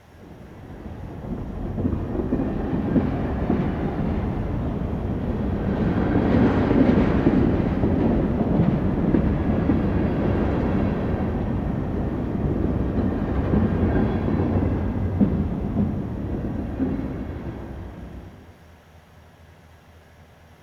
train distant pass; ambient

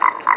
critter, canals; Half Life Model Pack; Half Life 2; ambient levels canals

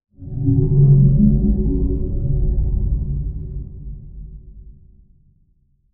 whoomp, synth; ambient; Portal 2

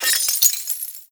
debris glass, bullet impact debris

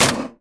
container damage; Counter Strike Model Pack; CS:GO; survival

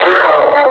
pain, suppressor

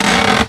wood strain; physics

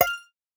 turret idle; Counter Strike Model Pack; CS:GO; survival

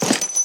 gear, combine soldier; Half Life Model Pack; Half Life 2

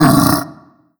pain, barnacle; Half Life Alyx

